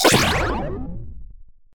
8 Bit Retro SFX Contemporary Game Over; David Dumais Audio; 8-Bit Retro Game SFX Pack

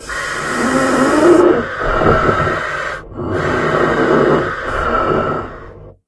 amb loop, cremator; hl2

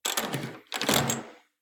Door, Knob, Twist, Turn; PMSFX; HAUNTED DOORS

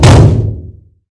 door hit; hl2

